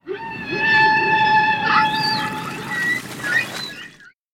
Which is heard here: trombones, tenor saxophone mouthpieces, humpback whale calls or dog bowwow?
humpback whale calls